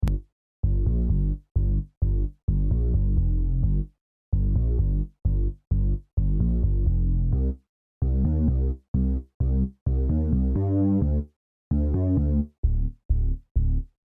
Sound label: Bass